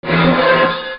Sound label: FRICTION